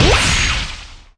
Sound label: space blast; Weird and Interesting Hits; single hits